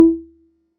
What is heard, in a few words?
pluck